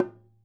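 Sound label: DHOL DRUM, ethnic percussion; WorldSounds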